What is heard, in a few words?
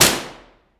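weapon pistol sup fire player atmo int; gmod; viper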